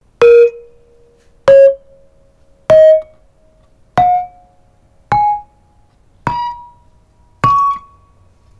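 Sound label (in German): Saron mittel extrem kurz, gamelan; Indonesien; HB-Samples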